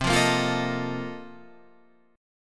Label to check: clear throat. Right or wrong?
Wrong